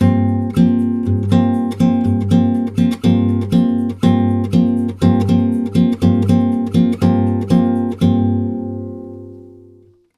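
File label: 120 guitar G minor 7, Brazil Bossa; USB Soundscan; AKAI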